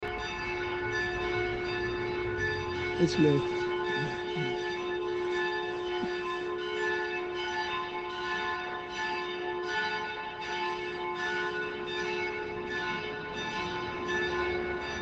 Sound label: bells